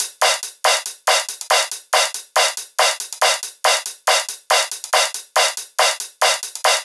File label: drum Loop 140BPM Full